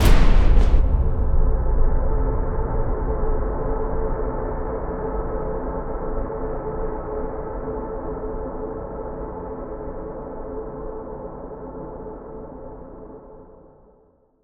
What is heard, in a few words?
STING, Cinematic Metal Impacts; boom library